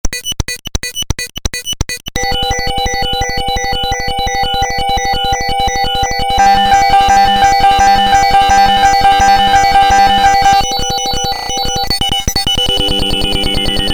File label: jingle; flake